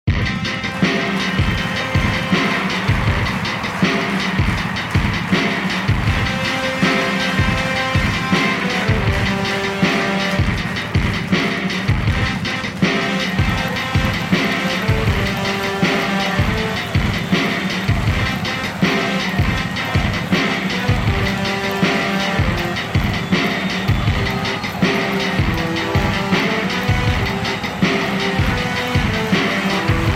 Marching Band Live

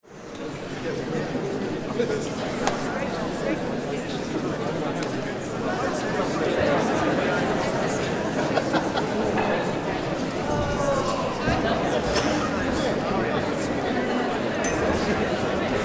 Crowd Walla; gelo-papas

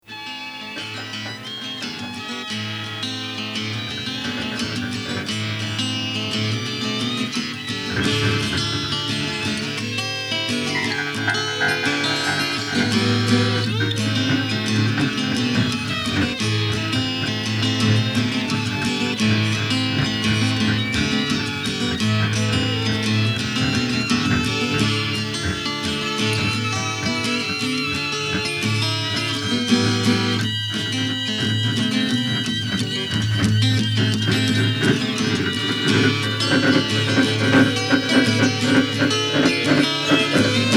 Organ jam

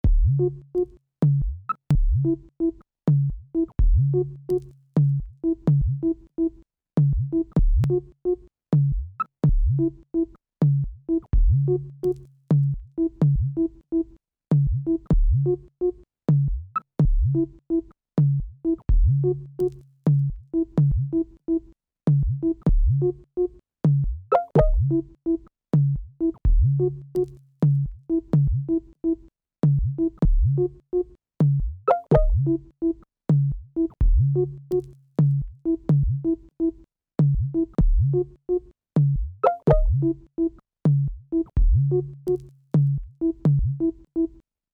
bass with bug and perc, 127; Loop Mania Sampler Pack

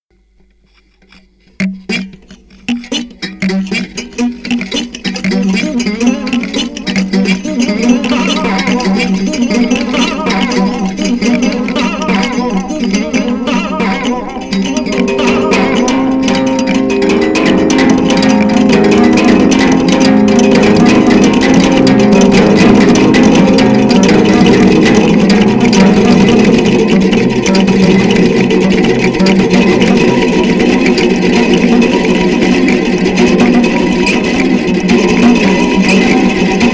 Toy Guitar

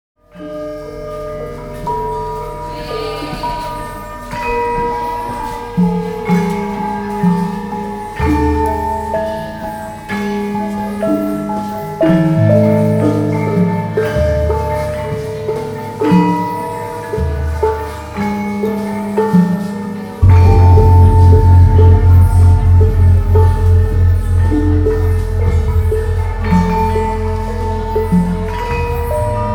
Gamelan Kraton, Indonesia; Les Cartes Postales Sonores; NOISE ON EARTH Vol5

